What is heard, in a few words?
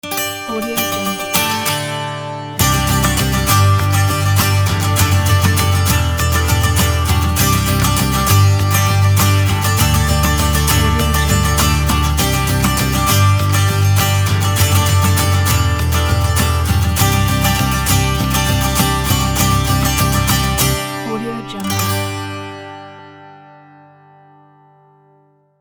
elegant acoustic intro podcast; pablikmm